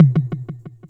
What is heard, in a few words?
Tom Delay, TR-606 Bent Samples